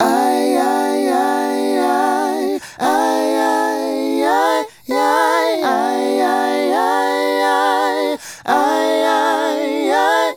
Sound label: Male Group, Hip-Hop and RnB Vocals